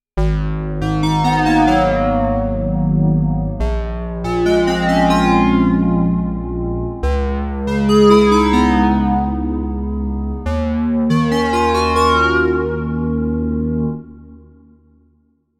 Cold Keys; korg bent

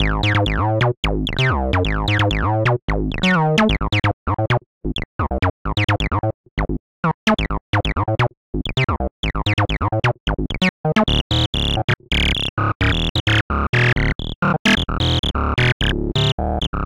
Acid Bassline; Robotizer

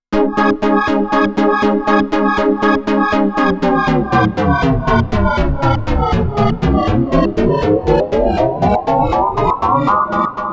Korg Layered Motion; Shift

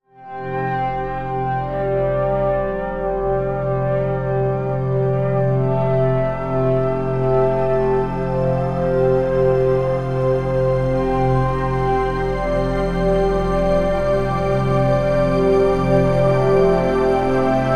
Wailing Pad; Luminance

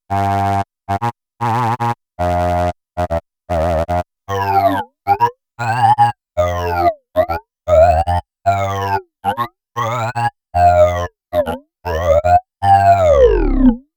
KORG Mono Lead; KORG Vibrant

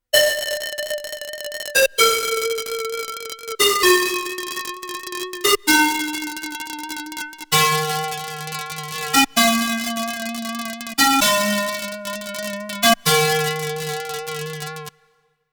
Sandy Flute; KORG Tube

